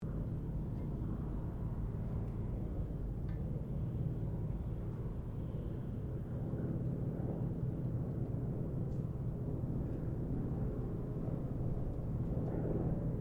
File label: 1977 YAKOVLEV YAK-52 SQUADRON DISTANT, Russian Yak 52 Training aircraft; Airplanes (Sound Effects:Vehicles)